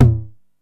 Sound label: TOM M, 909; musicradar-synth-samples; Classic drum machines; 909TOMS